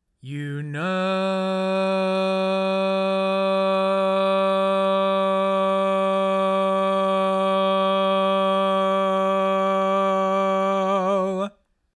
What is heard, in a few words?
Bass, barbershop tags